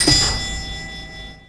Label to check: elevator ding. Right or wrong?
Right